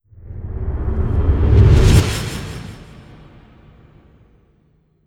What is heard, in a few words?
Ice Shard